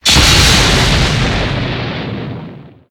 Thunder

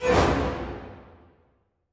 Dump Monster Die

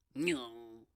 Zoteling yelp

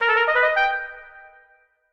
Whisper Left; king s quest chapter 1 a knight to remember gamerip

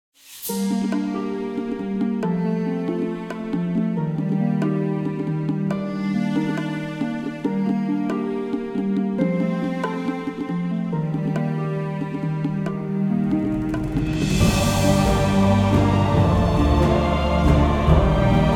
Elves Amb; Disciples III Reincarnation

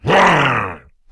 Creatures Fight Attack; Mighty Party

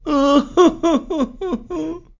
SFX Emoji cry; Golf Blitz 1.12

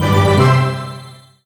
Combo; SFX Ingame Combo 4

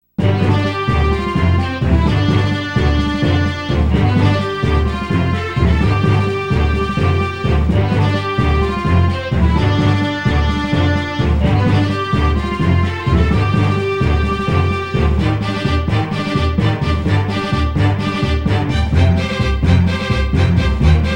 Synthesizer Version; Super Famicom Magazine Volume 13; Expedition

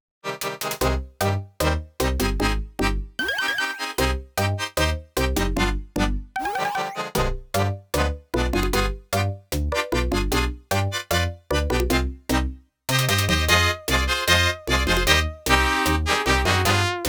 TANGO; Galaga '88

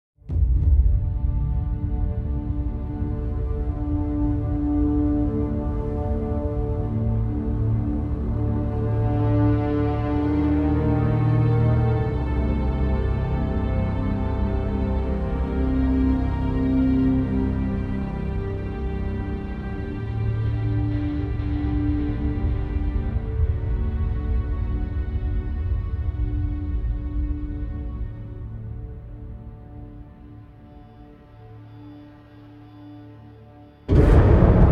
Fight Theme Ambient Short; Crysis 2

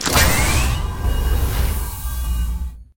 Pickups

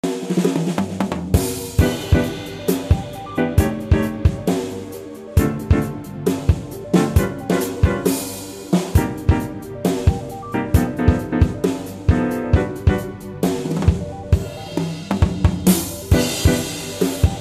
(Melody); Wii Sports Resort; Pick a Mii

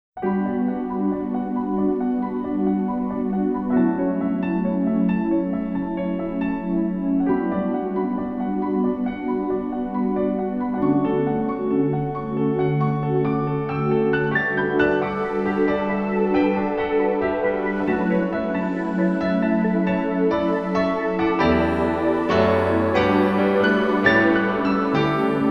On Our Way (Short ver. Instrumental); criminal girls

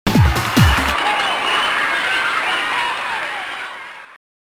Cheers; zupapa; arcade

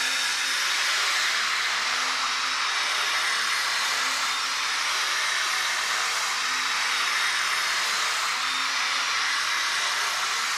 ambient energy

